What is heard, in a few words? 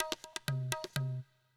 PERCLOOP ETHNO 125